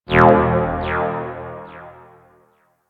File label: Ominous Techno Hit; Ambience; Warframe; Corpus Railjack